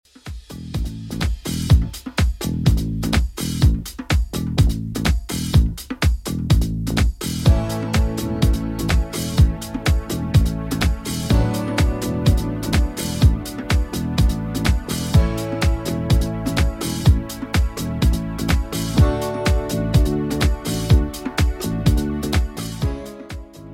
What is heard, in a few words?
70s Redrum 125bpm